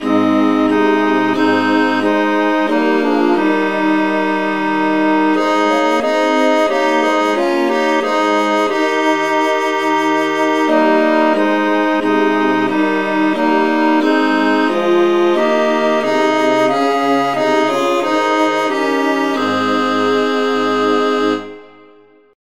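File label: traditional, key A flat; SAINTTHOMAS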